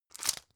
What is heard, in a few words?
drug pull out hard; berufe; arzt